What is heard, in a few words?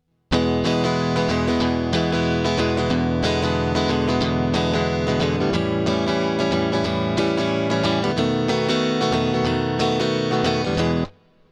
blues prog